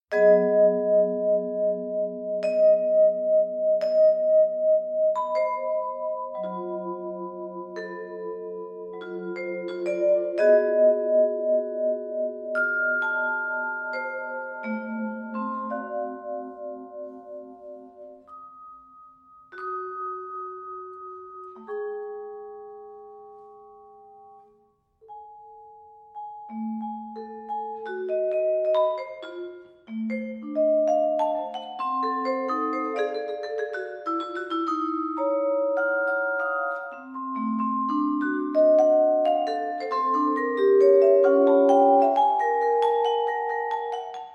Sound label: for vibraphone